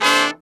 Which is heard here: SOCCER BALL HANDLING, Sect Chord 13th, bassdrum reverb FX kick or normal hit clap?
Sect Chord 13th